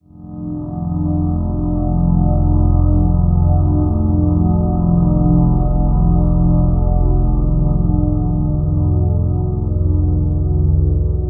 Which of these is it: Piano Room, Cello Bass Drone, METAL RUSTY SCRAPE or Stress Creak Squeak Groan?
Cello Bass Drone